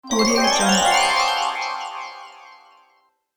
magic rpg

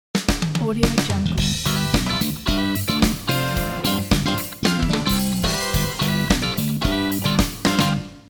sitcom logo

